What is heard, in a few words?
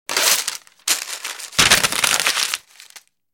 Gift Wrap Calendar; Snow Flake